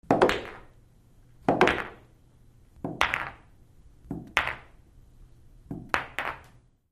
Billiard Action Ball, Billiards; Ball Dropped Into A Pocket